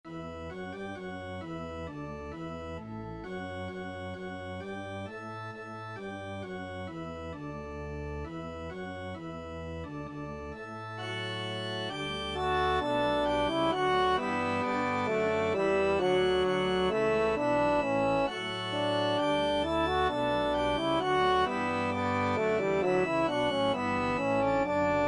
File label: Organ and brass